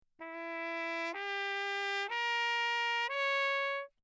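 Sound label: trumpet mute; Perinétventiltrompete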